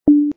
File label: boop